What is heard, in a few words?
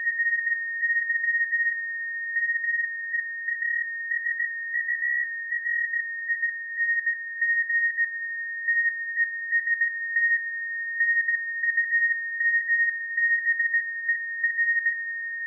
30m snip boost